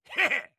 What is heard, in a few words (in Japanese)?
攻撃